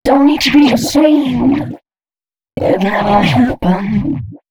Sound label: female c#, Dance Ultra Voice FX; Mutekki Media